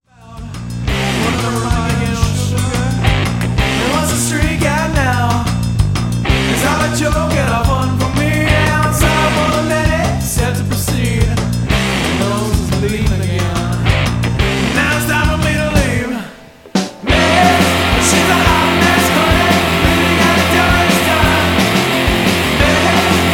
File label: Wrecked Chords